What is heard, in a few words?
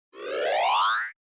Jingle Wave Start; Cake Invaders; PS4; Xbox Series X|S